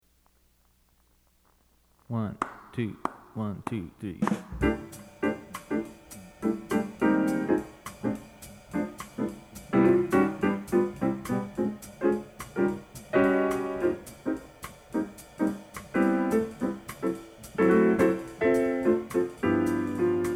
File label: double bass; Rhythm Changes (B flat), three choruses. Walk all three choruses.; jazz auditions; acoustic, double bass